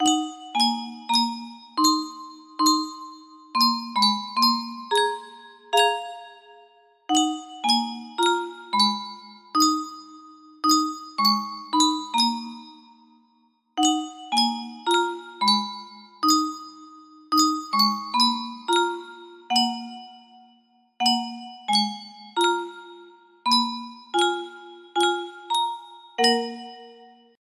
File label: music box